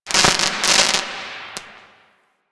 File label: Firework Crackle; Fireworks; Warframe